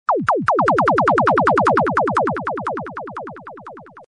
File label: lickshot; Laser